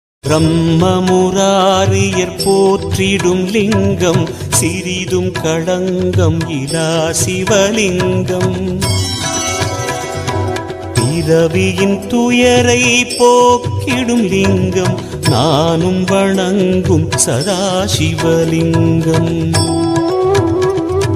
tamil ringtone, lord siva ringtone, devotional ringtone